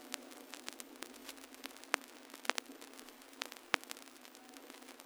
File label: Low Passed Crackle, Vinyl Noise